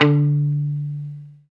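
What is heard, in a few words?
guitar mute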